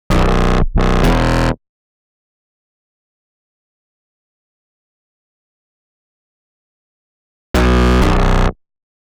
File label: 129 Bm Bass, Complex Electro Synths; Prime Loops; TranceOut